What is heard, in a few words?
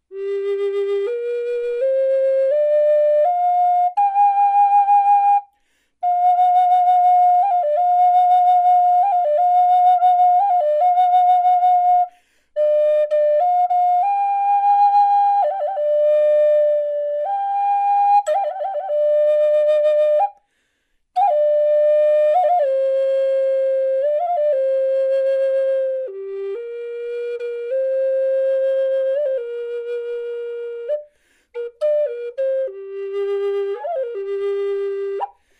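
natural, wood flute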